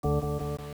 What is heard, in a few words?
Delete, earcon